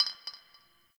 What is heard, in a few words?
Garbage Glass Drop; Warframe; Ambience; Nineteen Ninety Nine